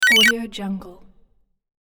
interface arp sci-fi fast beep; interface sounds

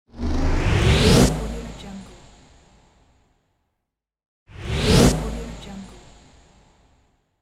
short riser; transitions and movement

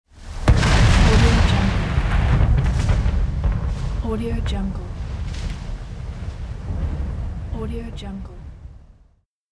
thunder lightning; رعد و برق باران

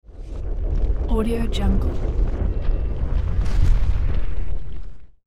earthquake tremors; لرزش های زلزله

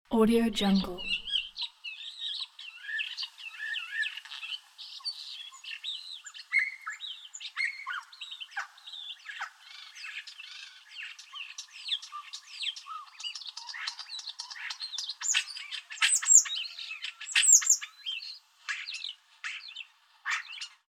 starling singing

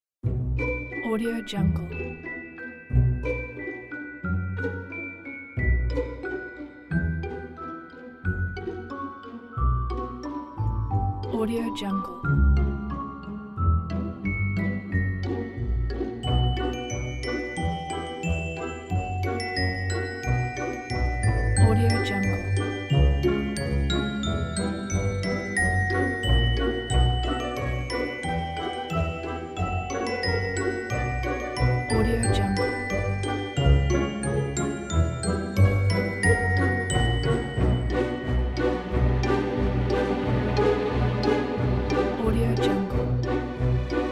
classic fairytale; موسیقی بی کلام; افسانه قدیمی

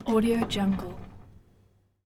microwave oven door open close movement

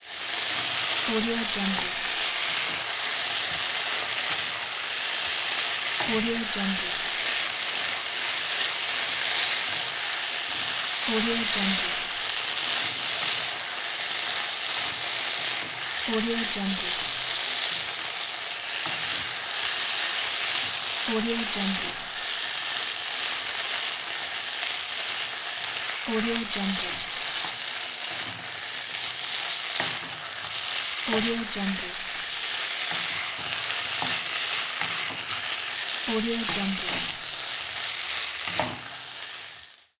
cooking with frying pan; domestic sounds

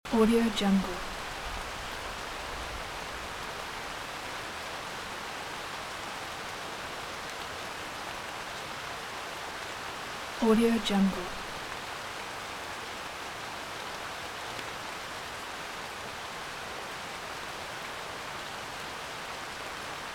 rainstorm in nature loop; nature sounds

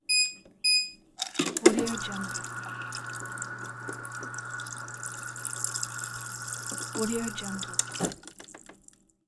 refrigerator water dispenser; domestic sounds

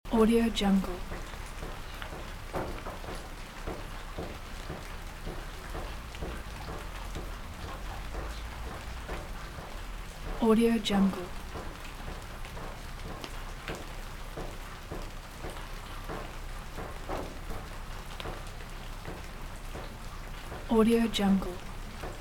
weak rain near metal roof loop; صدای نم نم باران روی; nature sounds

